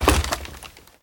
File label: Wood Ladder